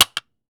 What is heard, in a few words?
clapper, American Natives; E-MU Formula 4000 Series Vol. 4; Earth Tones